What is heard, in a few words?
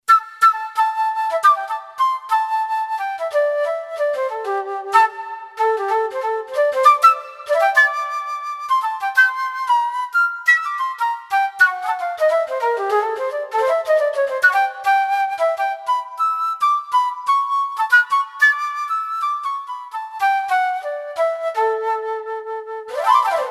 Sweet Flute; Yamaha PSR-550; Voice